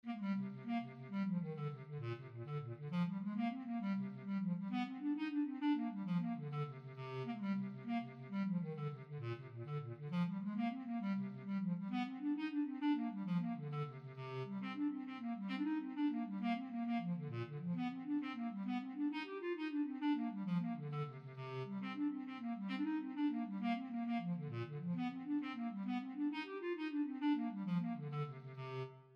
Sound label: bass clarinet; apples in winter